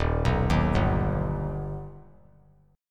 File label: Eb Augmented Eb; arpeggiated; JGuitar